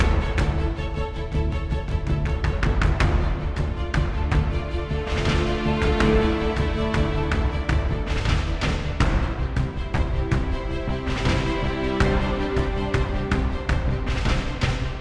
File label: Mood Mid; stormworks build and rescue